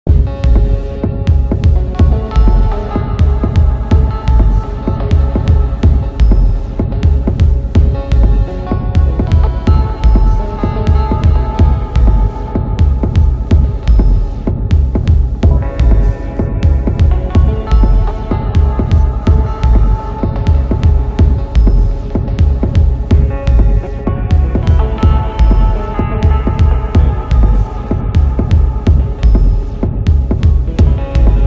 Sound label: Lullaby